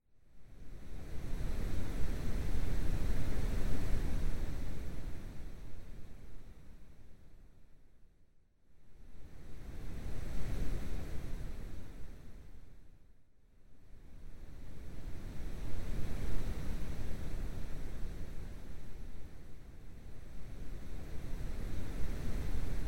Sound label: Wind; Flooded Grounds